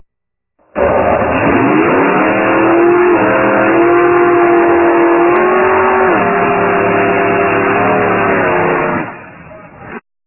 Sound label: duster440, burnout; moparts